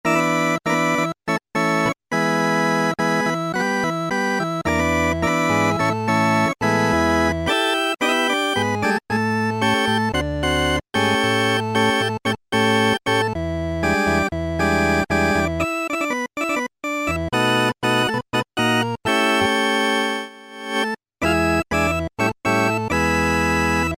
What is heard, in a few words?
Korg microKORG British Organ; Factory Voices